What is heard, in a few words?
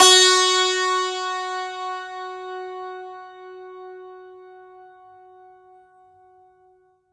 STR, SANTOOR